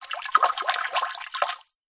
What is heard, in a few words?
nature water surface agitate swirl finger gentle plastic tub